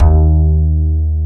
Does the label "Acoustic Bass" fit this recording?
Yes